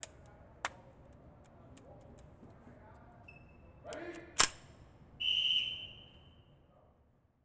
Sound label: loading rifle ready walla whis